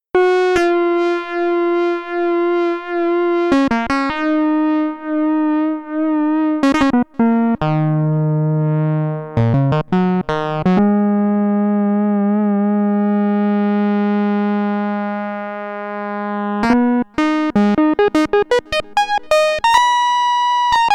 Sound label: MemoryMoog Short Solo